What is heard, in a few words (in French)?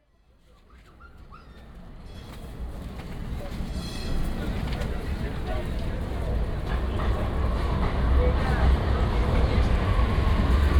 ballade au train